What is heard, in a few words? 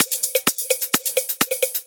Latin Loop 128 BPM; Best Service; House Definition VOL-1